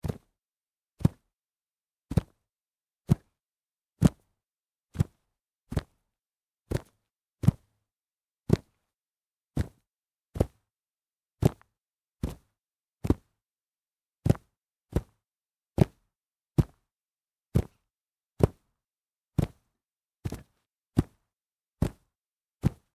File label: jumping on the grass